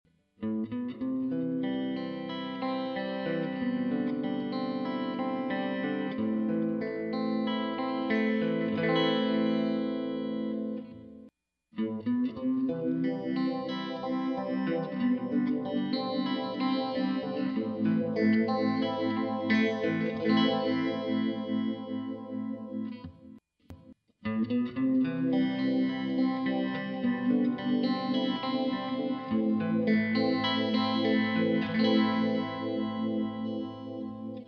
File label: vs axe